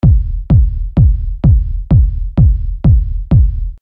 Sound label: low EQ